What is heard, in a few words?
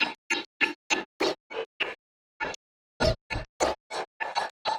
Side chain gated Topline (100BPM); creative gate; Loops; musicradar